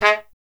trumpet; Miroslav Vitous; Solo Instruments VOL-1

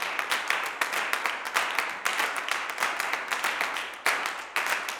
CLAPS 96; Spectrasonics; Vocal Planet; Blues Soul; G. CLAPS

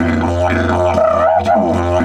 120, Didgeridoo

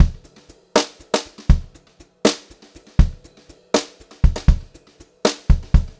Brushed Drum Loops; USB Soundscan vol.39; AKAI

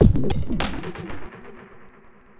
drbreak, drumbreaks; drumloops; Masterbits; Vinyl Attack

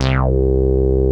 Prophet VS RESBASS; Greytsounds VOL-1

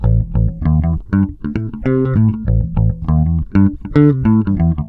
LIGHT 98 A, Bass Grooves; USB Soundscan vol.30; AKAI

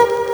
f4, Dance Kit, EMU E-III; Best Service ProSamples vol.55 - Retro Sampler

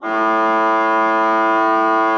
A2, Cathedral Organ; ILIO; Propeller Islands

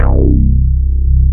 RESO BASS, OLD SYNTHS; Rubber Chicken Software Sample Bank

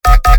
Dial Tone w Sub

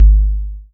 DX kick boom; E-MU Formula 4000 Series Vol. 2; OrbitPercMaster

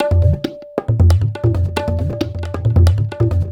TABLAS; Zero-G; Chemical Beats

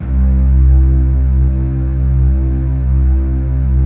PAD, DIGI-PADS

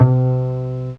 Acc Bass C4, Fairlight CMI IIe, Basses

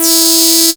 CREEPY E3, sequencer; Best Service Alpha Dance vol.2

